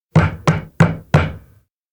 hammer wood; anemoi

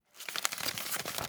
paper bag; anemoi

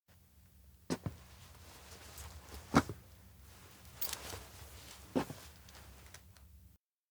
steps indoor workshop to plane; anemoi

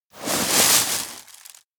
vinyl bag hand; anemoi

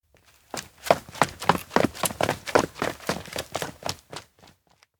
steps outdoor town run start pull hand; rikka; anemoi

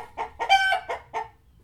Chicken; anemoi

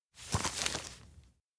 fold map; anemoi

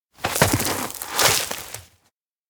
climb rock hill stand; anemoi

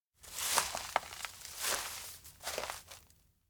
steps outdoor grassland stop walk; fumiya; anemoi

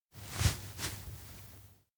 pull hem; tsumire; anemoi

